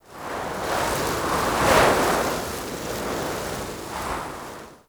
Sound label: Forest Wind Fast Gusts; Warframe; Grineer